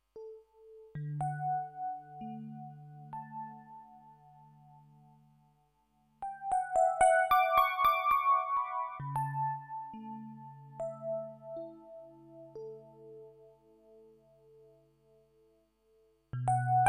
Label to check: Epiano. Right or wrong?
Right